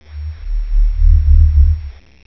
rust forcefield; ambience

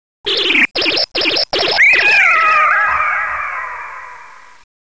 rust death, ambience; towerscene

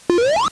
load

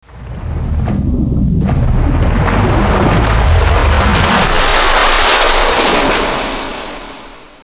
lava drain; ambience

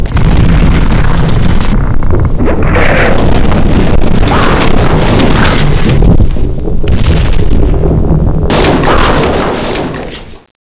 disaster; ambience; mayan4

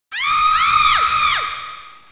f scream; ambience; rebar

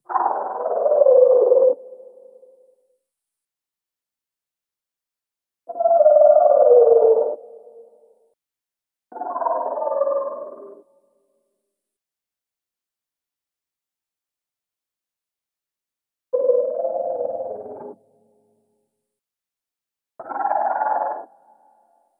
protoloop; xen

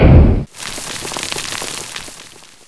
pick stone

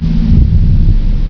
very low thrum, ambience

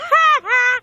haha

